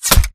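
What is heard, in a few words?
impact bullet generic headshot human; Titanfall 2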